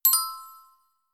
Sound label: notification; samsung Aleart